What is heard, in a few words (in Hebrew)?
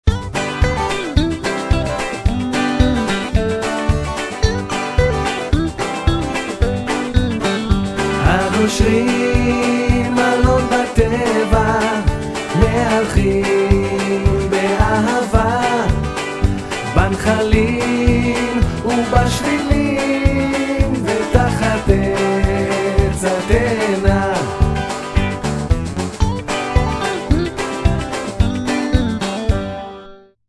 גינגלים